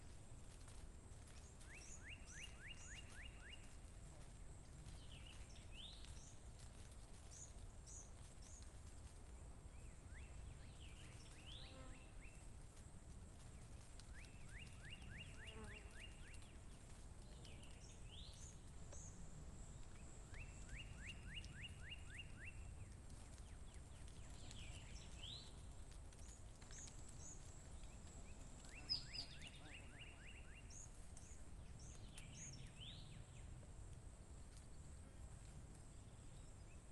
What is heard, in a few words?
forest scrub forest ambience with birds; sonniss gdc